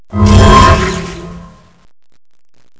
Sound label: tank stealth decloak; nod